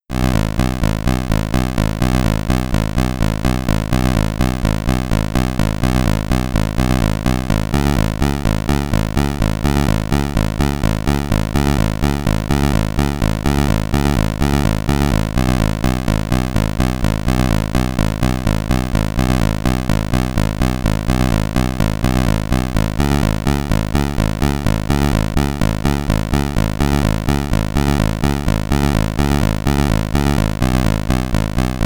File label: Battle Theme (SID Stereo), commodore 64; Samurai Warrior